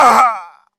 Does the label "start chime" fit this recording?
No